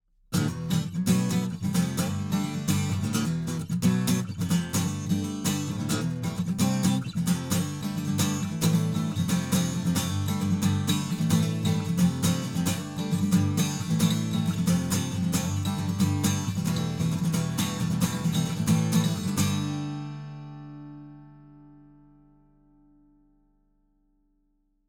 Fame Pro Series SD-100 test kugel strumming korpus-hals l bauch r

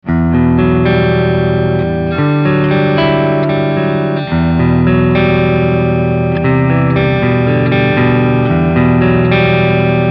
esp ltd ec-401 clean hals pickup

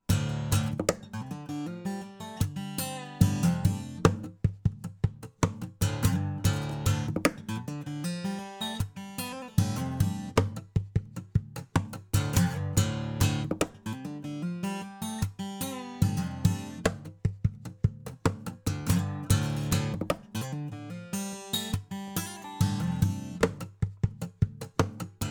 niere perkussiv lowcut korpus hals bauch; fame pro series sd-100 test

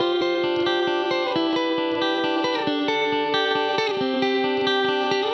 gitarre dry; softube trident a-range

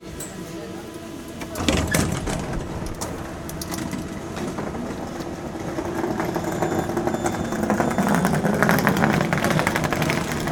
strassenbahn tuer auf koffer vorbei

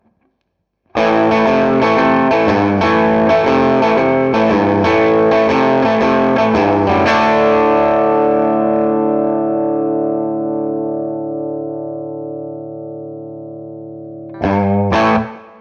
e-gitarre git-room; fabfilter pro-r

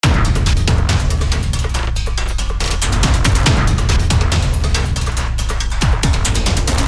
loop elektro; ni heavyocity damage testbericht

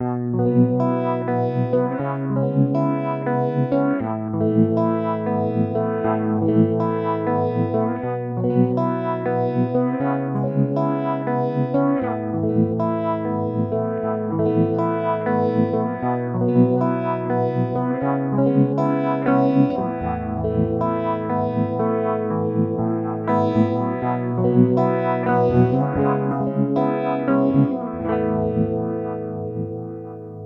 kammfilter phaser

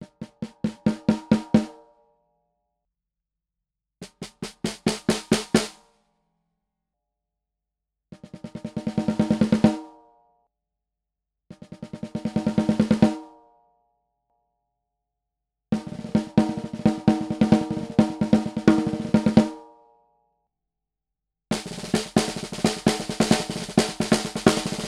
beyerdynamic tg d35d snare klemme oben unten

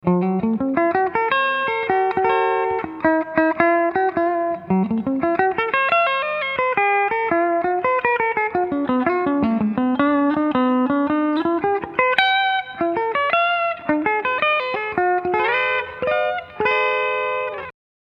epiphone casino coupe steg p90

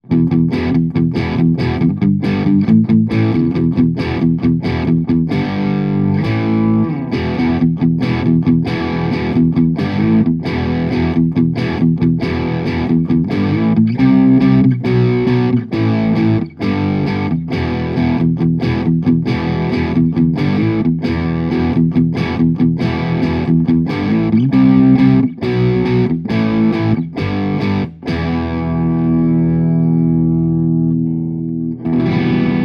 gitarre angezerrt; rode k2

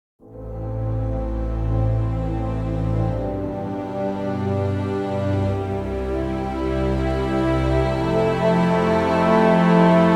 orchester mit Hall

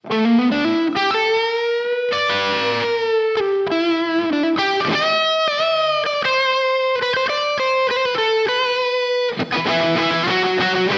plate solo 12 uhr; KONG Touch My Hall; tb audio

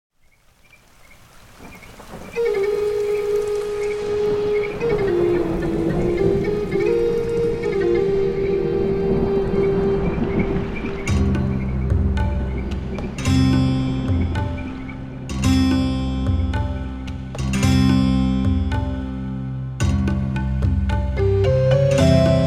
camel audio alchemy weltmusik; 6 instanzen

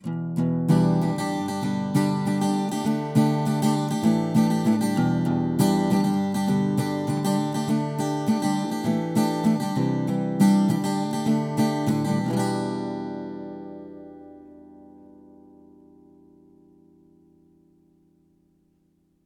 a-gitarre neve 1073 hi-z pre 65db; 12.bund 220hz

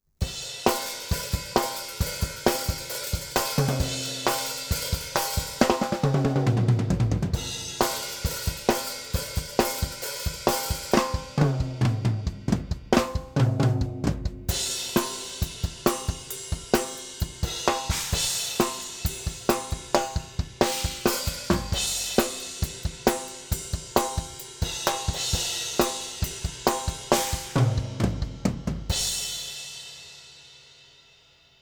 drumset rock beat mit fill ins; img stageline

